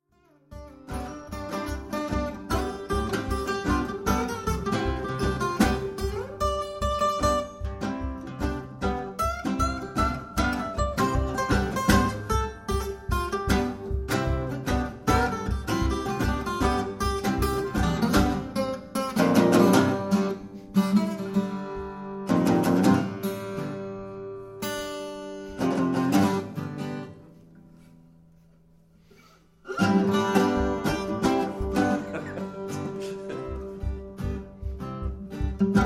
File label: Trio Impro